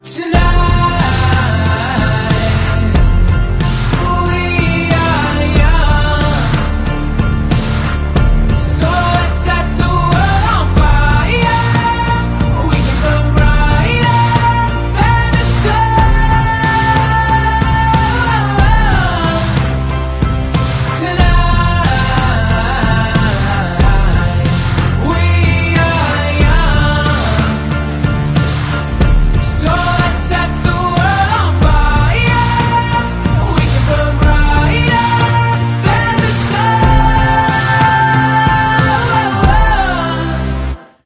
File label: Ringtone